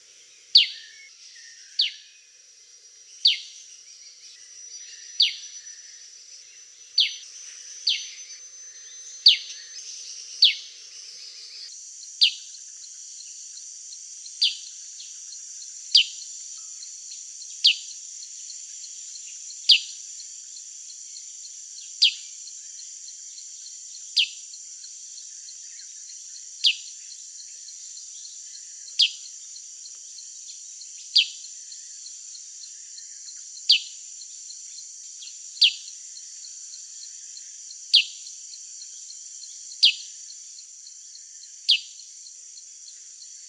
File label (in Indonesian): Suara Burung Kutilang; Full Isian